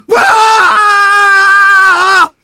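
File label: engineer pain critical death; voice packs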